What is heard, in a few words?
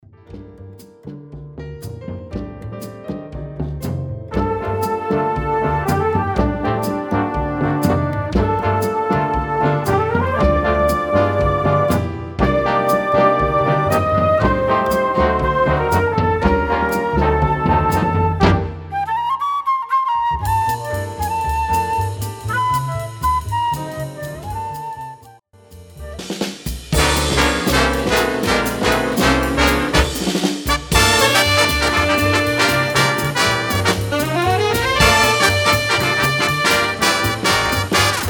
afro cuban bolero, Big Band